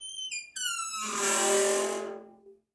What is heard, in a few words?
Vault Inner Door Open; safes & vaults